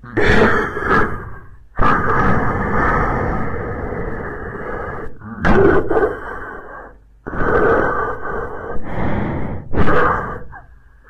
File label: Zombie walk; guns n zombies 2014 pc